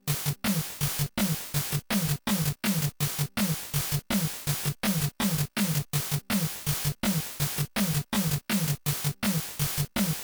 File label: Mono Stereo Transition Beat; super spy hunter; nes